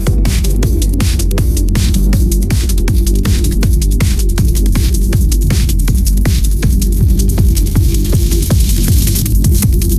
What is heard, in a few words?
musicgen drum and bass